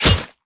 beat

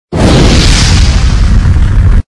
fire

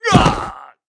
hurt; hero1

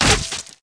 beat

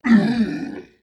howl man

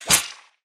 sword

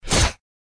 beat

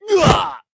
hurt

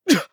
hurt

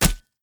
beat gun

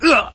hurt; hero1

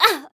hurt nvhai

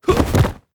rush; qiang

